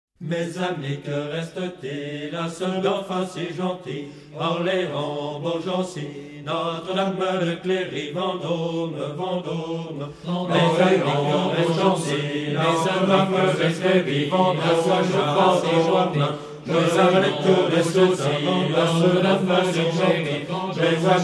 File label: carillon Vendome; musique